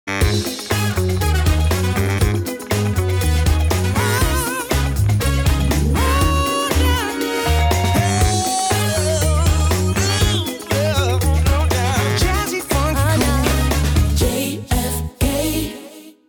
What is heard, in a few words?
Jingle Blazerssectie